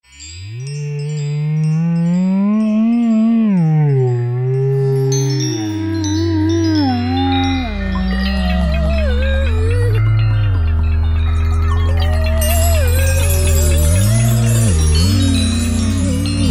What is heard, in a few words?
Jungle Bells; Roland M-DC1